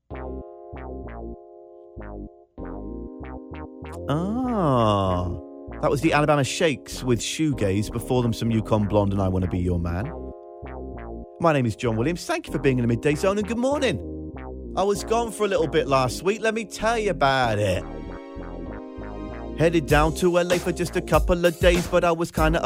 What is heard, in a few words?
LA RAP